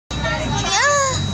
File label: moaner